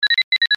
Incoming Message; MIUI12

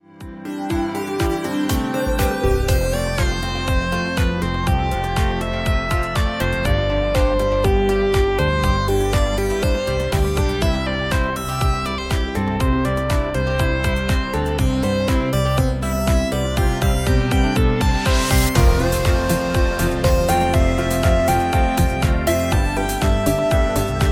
Game Arcade, ringtone; MIUI12

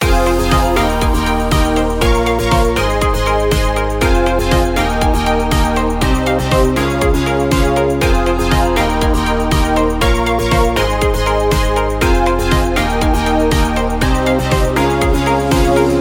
Future Pop (Loop B); MIUI12

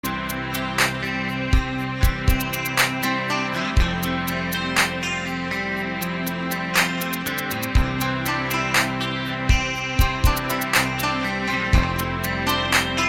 Instrumental, ringtone

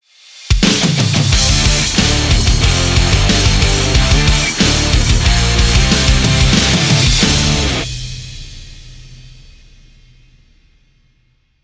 Rock Intro; MIUI12

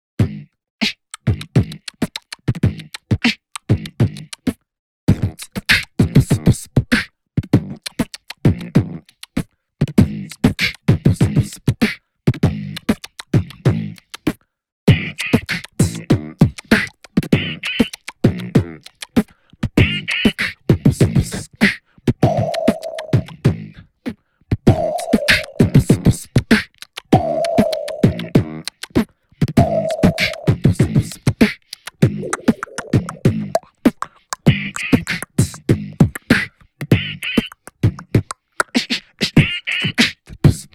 beatbox; MIUI12

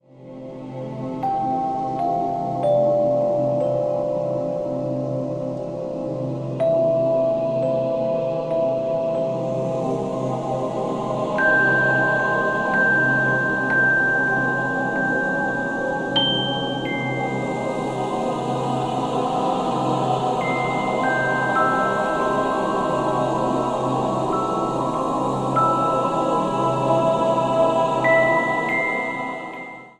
Mysterious Atmosphere Cave; MIUI12

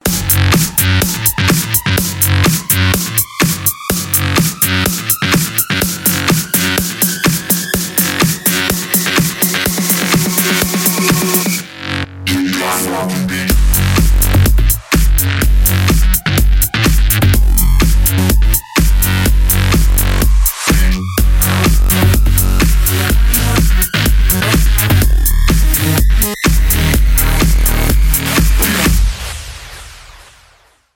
Phunky Beat; MIUI12

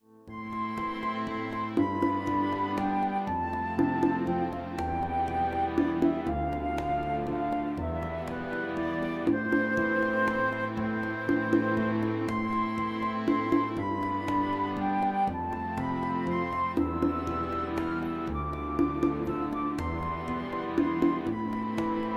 Pop Healing; MIUI12

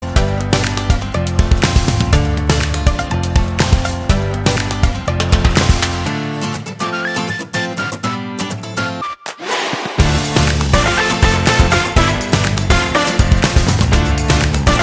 Upbeat Pop, ringtone

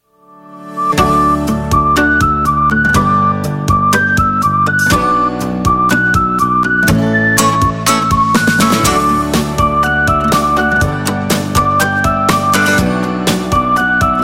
Upbeat Acoustic Walk; MIUI14; HyperOS 1.0

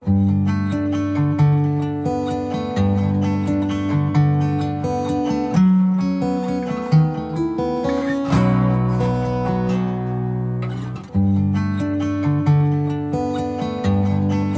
Passionate Acoustic Loop; MIUI12

